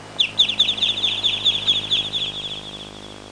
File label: Miscellaneous chirping